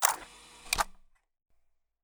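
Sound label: inventory save, interface; STALKER; IWP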